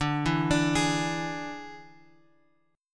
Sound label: D Suspended 2nd Flat 5th D; Dsus2b5 Chord {X 5 2 X 3 4} arpeggiated